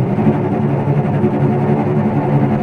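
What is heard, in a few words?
tremolo, strings; East West - The Ultimate String Collection; VCS TREMOLO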